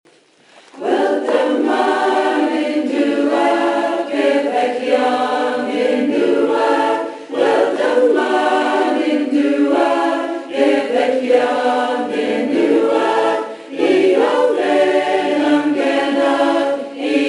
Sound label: Y Fari Lwyd (Tenor)